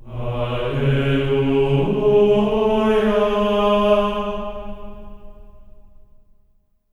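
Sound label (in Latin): alleluia C#4; Spectrasonics; Symphony of Voices; GR ALLELU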